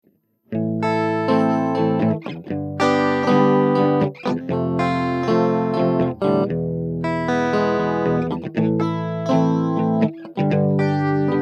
Classic FX guitar Studio D Chorus

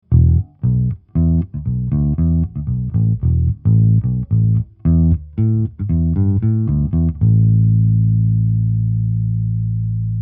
Neumann V476 Bass U87

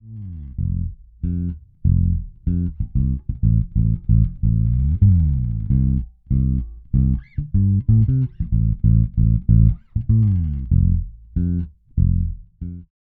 Zoom B2 Four Super Low Preamp

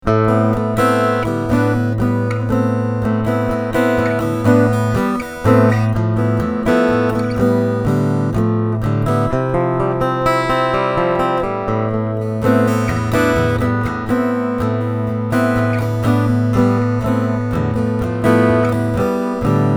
Fender AM Acoustasonic Strat

